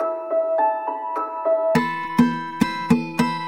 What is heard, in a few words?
piano, Sad